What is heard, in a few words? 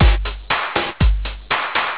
drum